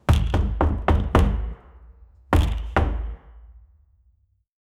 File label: kicking in a giant wooden